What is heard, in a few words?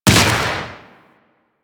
Projectile Explosion; Warframe; Sentients; Brachiolyst; Abilities